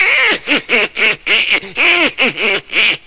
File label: duck laff